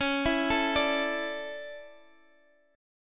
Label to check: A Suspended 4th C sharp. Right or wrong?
Right